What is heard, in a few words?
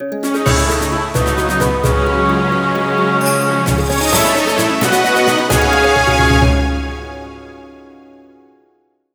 Dungeon Victory